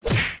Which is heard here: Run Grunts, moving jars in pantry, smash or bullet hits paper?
smash